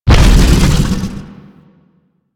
Biped Vocal Death; Warframe; Man In The Wall

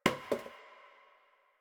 janitor paper towel drop

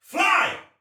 Shoot Arrow Soldier Male; Dialog; Barks; Battle; Sinecure

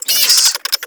Infested Minion Pain, Vocals; Warframe

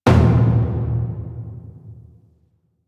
Taiko Drum

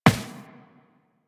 footsteps walk; Warframe; Tenno

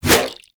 Sword Swipe, Whooshes; Warframe; Weapons; Melee; Pacifist Sword And Board

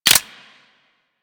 Blade Close, Weapons; Warframe; Tenno; Soma Kama

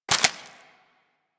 Bullet Impact Debris Wood

